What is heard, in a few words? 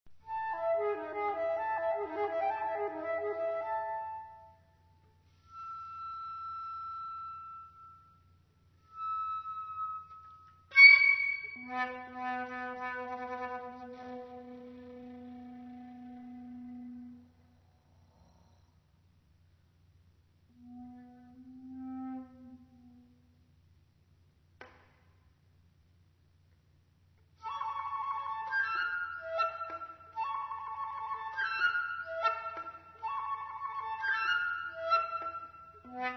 flute